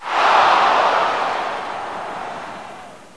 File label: MISS GOAL; swos-soundset